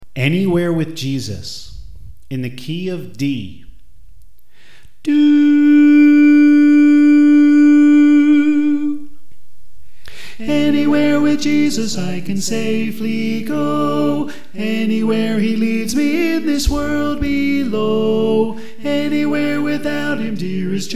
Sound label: Tenor